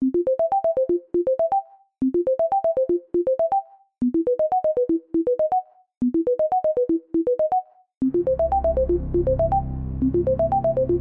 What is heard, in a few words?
bubbles